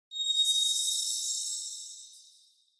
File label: Onoma Sparkle High, 演出に適した光り輝く音色、「キラキラ」といったサウンド; オノマトペ キラキラ12-1(高)